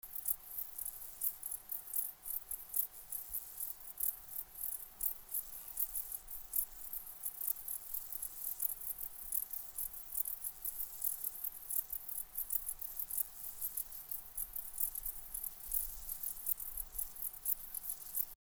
Pholidoptera fallax dorsatus, declivus, dispar, Thermenlinie